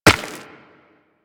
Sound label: Run Rock, Footsteps; Warframe; Operator